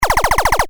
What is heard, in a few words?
Retro SFX laser loop, Laser: Firing Retro Style; Lasers; Stuart Duffield